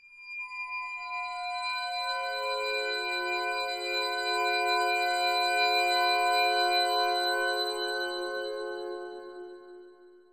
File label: Violin Bell